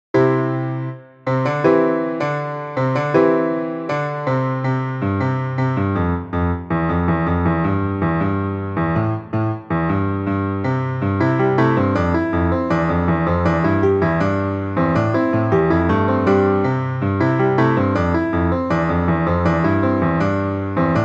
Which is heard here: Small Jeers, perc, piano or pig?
piano